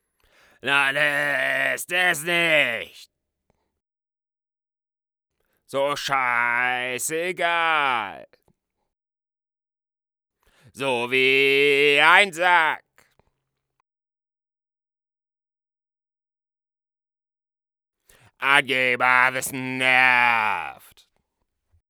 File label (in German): stadion punkrock chor